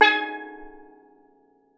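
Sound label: STEEL DRUM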